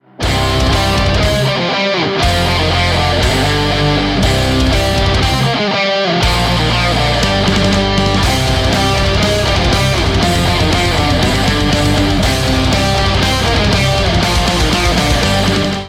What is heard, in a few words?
march metal; Soviet